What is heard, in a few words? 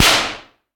slap